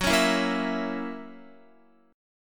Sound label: Gb 7th Gb down Guitar, chord; strummed; JGuitar